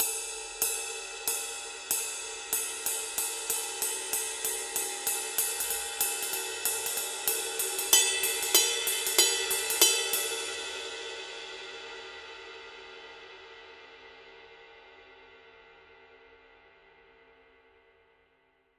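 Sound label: signature 20 full ride pattern, cymbales; Forgées à partir d’un alliage secret de bronze; paiste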